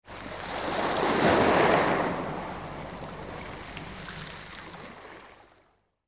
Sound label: wave